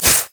basicattack; LoL; irelia

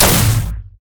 grenade; rumble

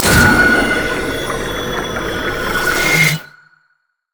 undersea lantern; LoL; thresh

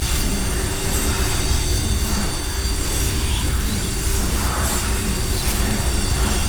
primal surge loop; nidalee base

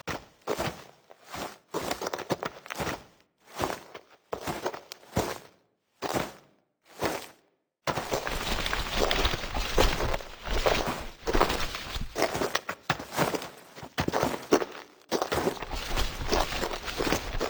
dance loop; LoL; janna forecast

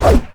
basic attack; renekton skin06

